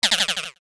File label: Tech, Weird Side Samples